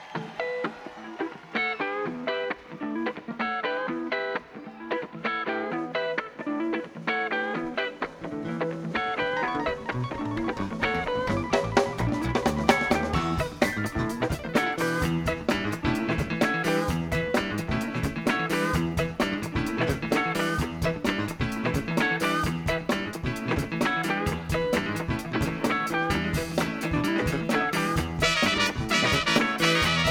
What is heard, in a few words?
Alumni Blues; New York, NY; The Academy